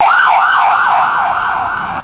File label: distant emergency vehicle siren burst